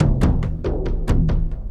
Boonka Doonka Doonka Doonk, Drums, Frame, Kendang, Short; Sonic Foundry (Sony Creative Software); Friendly Fire